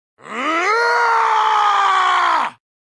male generic warcry

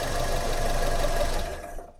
gasoline ending, Vehicles Engine

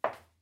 heels clack, Footsteps

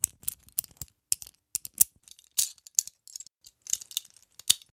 cuff breakout start, Handcuffs

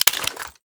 pistol mag out; Weapons; Guns Interact; Civ

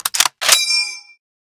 Garand Unload, guns; Weapons

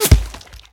bullet meat, guns hits; Weapons

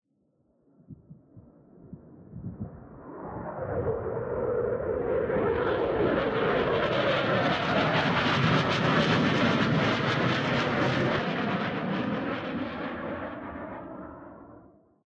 f16 center, Aircraft; Vehicles

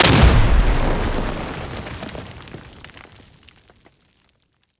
frag grenade; Weapons; Explosives; Explosions

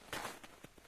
snow, footsteps; civ13; civ14

